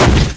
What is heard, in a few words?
vehicle impact medium, v8; Source Engine; Portal 2